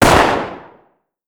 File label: battle fire, battle rifle